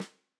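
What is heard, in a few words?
Tam Snare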